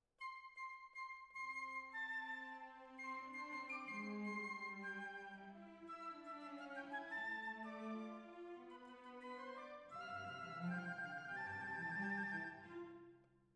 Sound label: Violins, jazz for piano